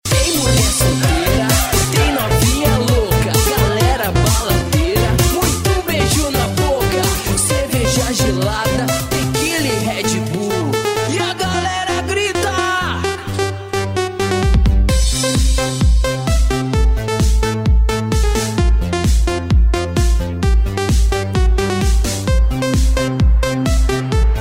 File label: electro funk